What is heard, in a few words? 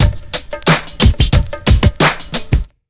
90 BEAT, Pure Electro; USB Soundscan vol.03; AKAI